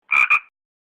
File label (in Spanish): sonido de rana, frog sound